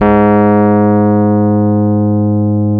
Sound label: G#1, Electric Pianos; Wizoo